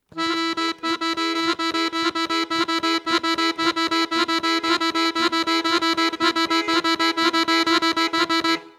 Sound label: prall